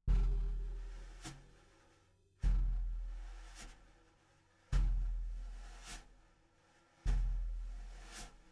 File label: SNR Bot